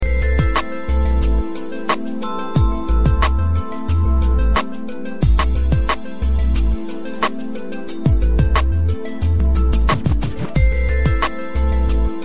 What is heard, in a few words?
radio groove salad; soma fm; underground alternative